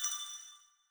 count Up Loop End; netent

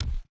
reel bounce

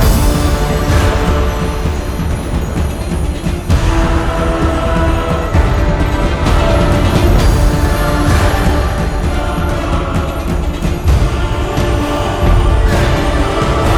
big Win Amb Loop; netent

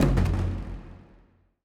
quick stop

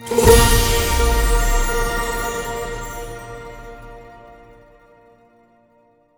mega Win Stop; netent

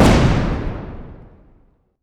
big Win Text Change; netent

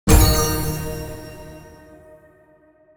medium Win; netent

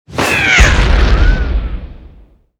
frespin win large; netent

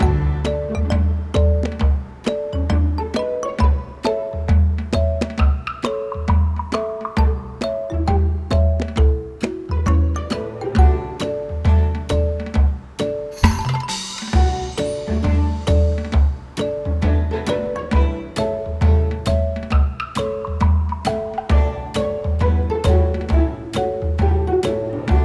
ambience General mobile; netent

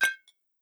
dice coll glass; netent

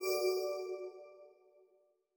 wild Swoosh; netent

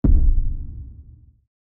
quick stop; netent

